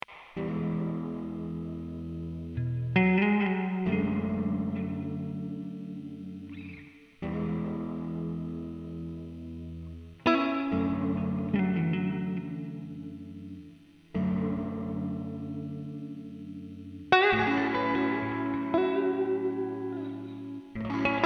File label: slow